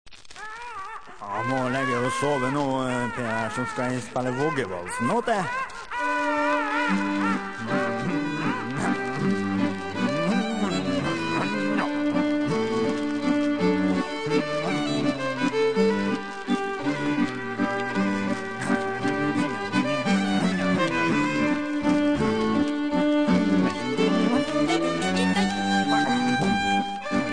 Voggevalsen

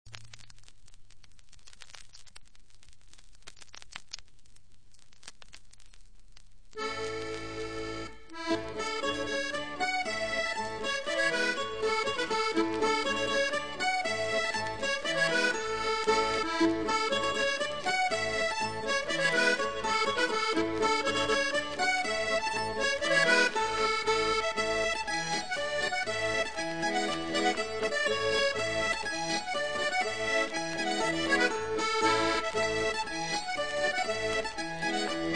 Skotsk

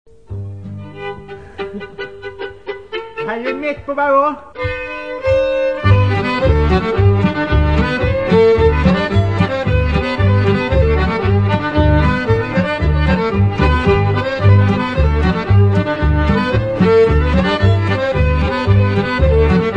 Pariser

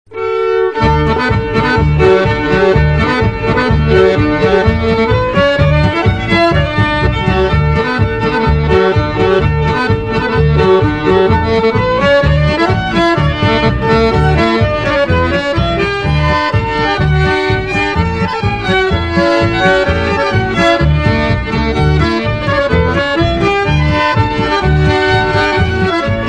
Skotsk

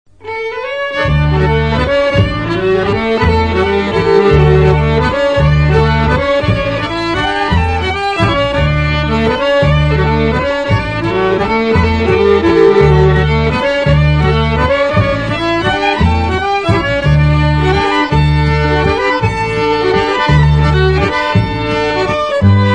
valsen; Hoven

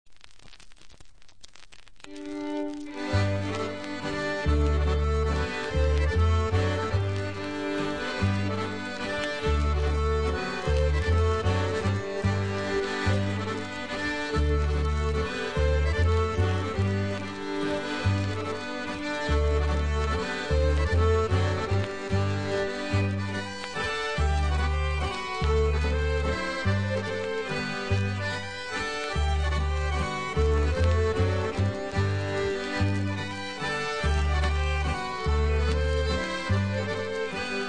masurka; Hatlen

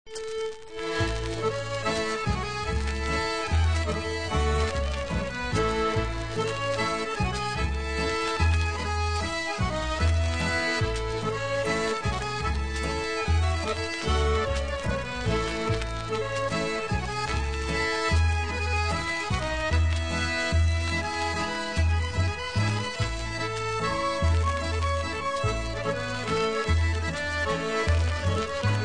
Polsdans fra Tomrefjord

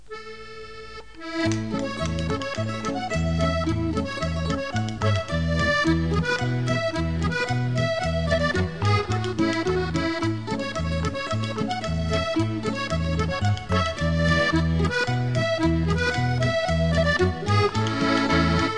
Polka

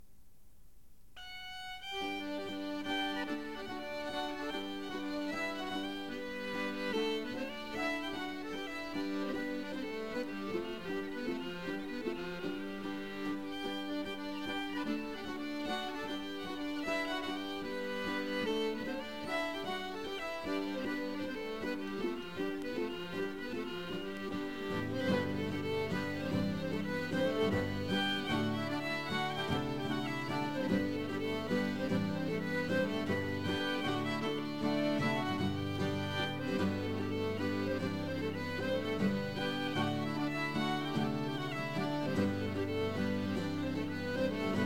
Polsdans

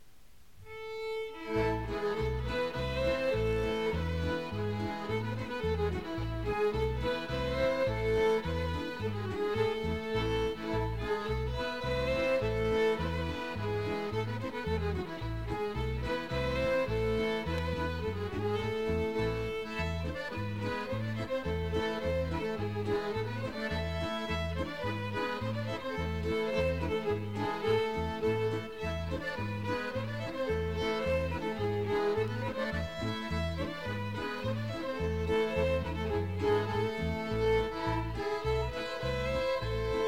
Pariserpolka fra Oppdal

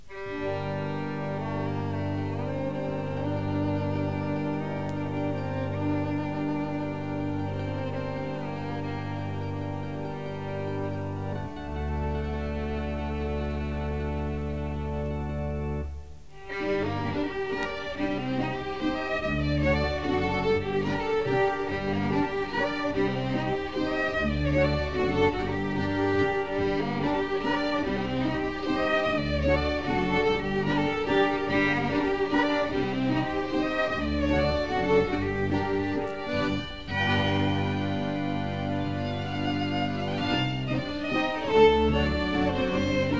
Masurka

